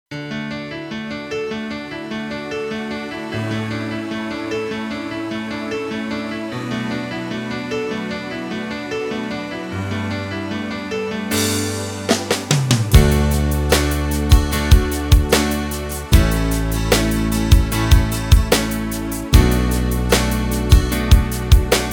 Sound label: Piano Ballad